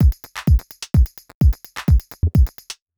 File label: drum; whisper